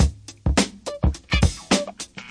104 bpm wakka groove

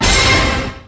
orchestra hit; Punches Hits Discoblasts

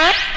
Electro FX; Ueb HE Electro FX

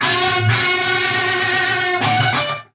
straight up guitar lick

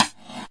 GATE STIK, Gated Reverse Slapback Kit; drums full kits; style kits

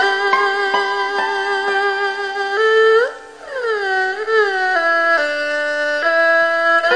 chinese traditional; WORLD

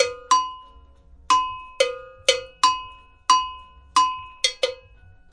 090 bpm agogo real life

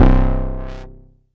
Arturia MiniBrute syn

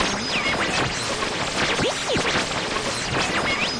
radio noise

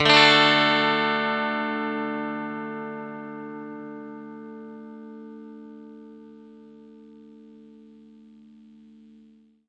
clean chord D, clean electric guitar chords

